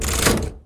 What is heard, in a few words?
lever; moonbase